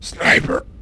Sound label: die; svencoop; officer